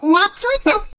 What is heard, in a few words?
subtle menu button hover